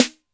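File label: Snare Dance, Alesis D4 drum machine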